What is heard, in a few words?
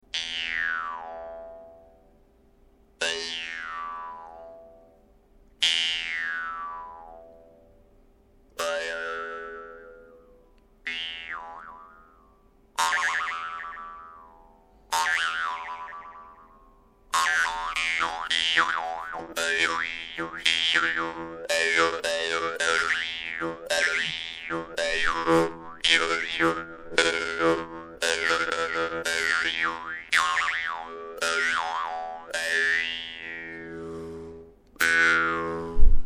vargan; russian vargan ustinov middle